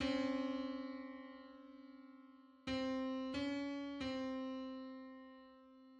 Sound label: Tridecimal semitone on C